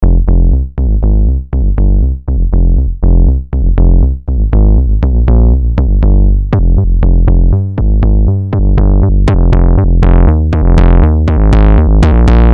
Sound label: Bass Guitar; Strobe BA